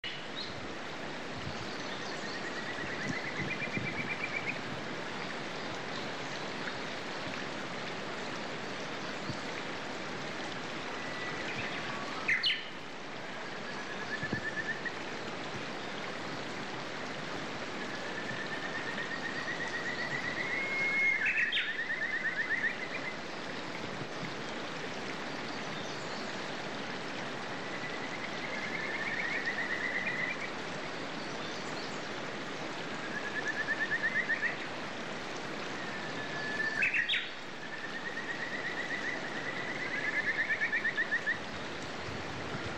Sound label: kajika